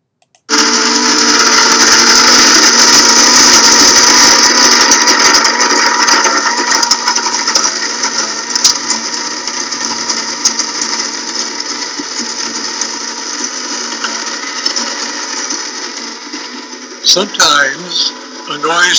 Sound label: Cuisinart coffee maker grinding beans; noise diagnosis